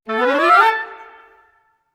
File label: Woodwind run A7 (for D min); PowerFX; Downtown Orchestra; Runs